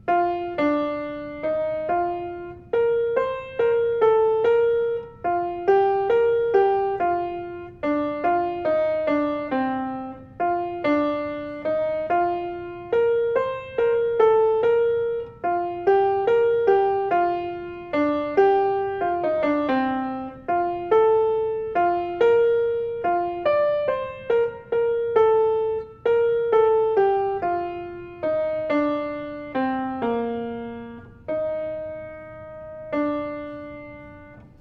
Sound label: StPetersburg MELODY